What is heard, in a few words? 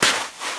STEPS Sand Walk; UltimateFishing